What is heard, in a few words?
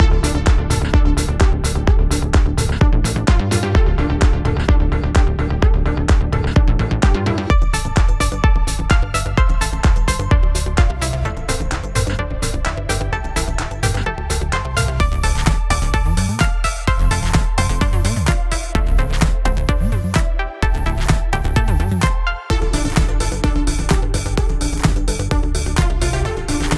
battle dungeon